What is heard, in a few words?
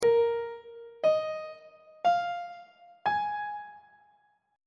b flat dominant 7th suspended 4th chord intervals on treble clef